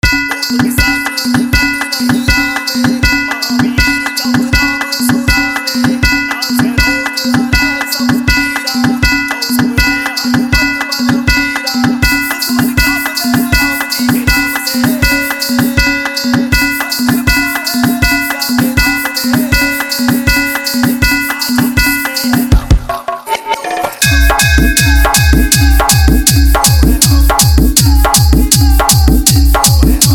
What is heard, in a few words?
Special Dj Remix Song